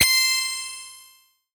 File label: star collect; A Cat Game